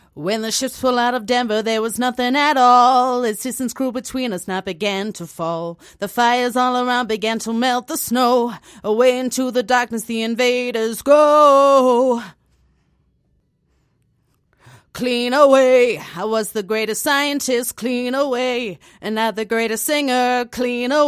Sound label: pop 85 Bm